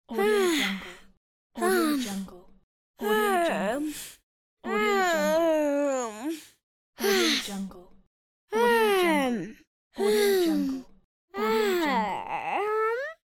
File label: tired little girl yawn